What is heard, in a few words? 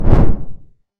order resolved